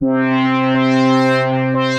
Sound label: Aspiring Cathedral, Synth Keys; Ableton; Creative Extensions; Racks